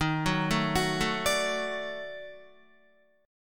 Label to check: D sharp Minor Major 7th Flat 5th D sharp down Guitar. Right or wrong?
Right